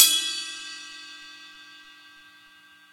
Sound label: Ride